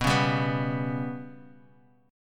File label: B Suspended 2nd Sharp 5th, Guitar, Half Step Down; Bsus2#5